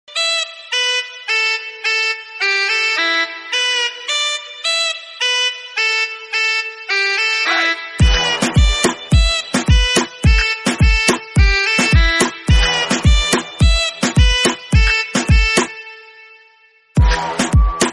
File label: instrumental music